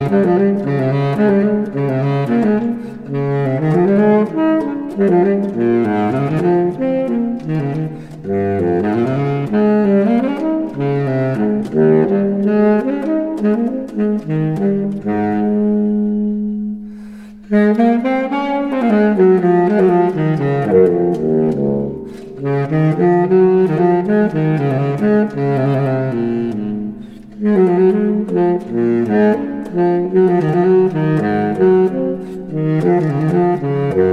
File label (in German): allemande baritonsaxophon